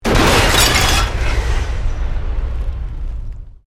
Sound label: Explosion Medium Metal Glass; Created explosion effects, house, building or vehicle effects; Bombs